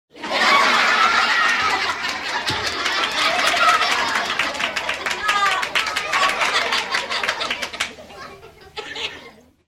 laugh track

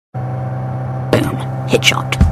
headshot

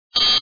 fail

